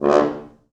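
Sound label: French Horns staccato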